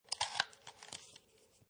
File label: Hole Puncher, paper puncher, punch hole in paper; Classroom; Schools